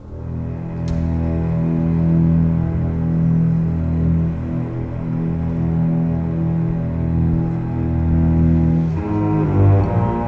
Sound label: Cinematic Strings, Orchestral